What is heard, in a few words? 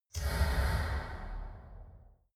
steam puff short